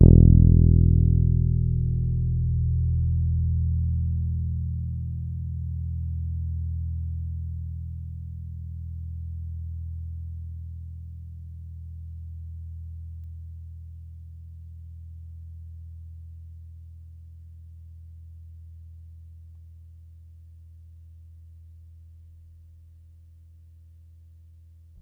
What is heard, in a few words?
Es E2 hard, Bass, Pedulla Thunderbass; Giga Samples Collection